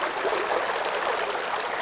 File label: ambient stream; MX vs ATV Unleashed